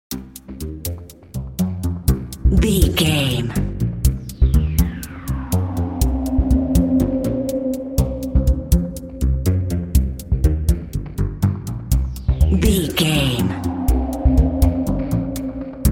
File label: mysterious mission video game narrative